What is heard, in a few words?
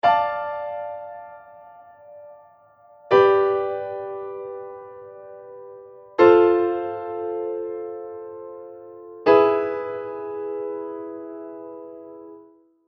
4 bar piano chord loop 78 bpm; sound bites resource kit